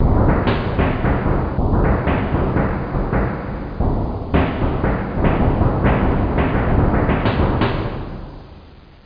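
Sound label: crawl pipe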